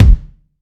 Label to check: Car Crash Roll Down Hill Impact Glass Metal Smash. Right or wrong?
Wrong